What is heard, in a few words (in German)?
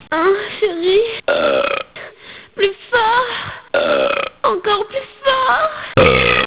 ruelps